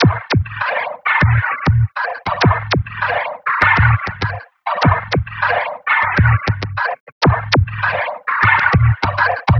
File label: Zappy Loop; Sonic Foundry (Sony Creative Software); Esoterik Beatz